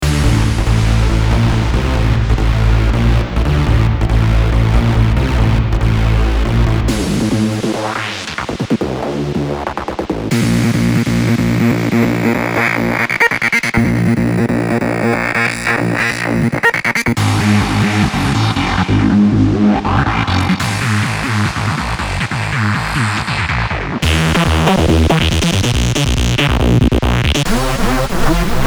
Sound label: Virus TI; 128 Lockdown